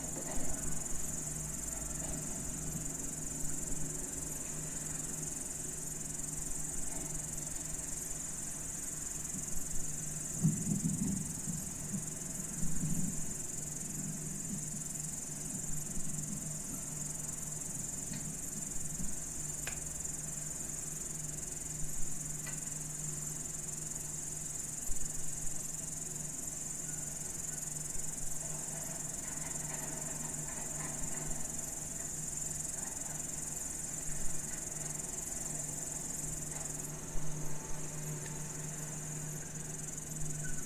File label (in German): Grillen